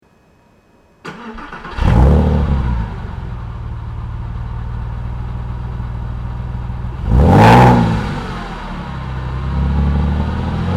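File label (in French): 996 demarrage pot sport, Porsche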